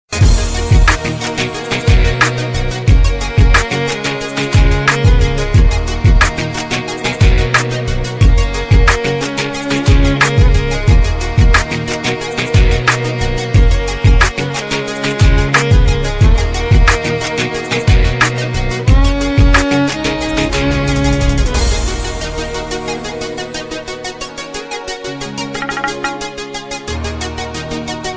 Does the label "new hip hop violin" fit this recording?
Yes